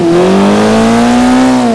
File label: second, vehicles; gmod; tdmcars; xbow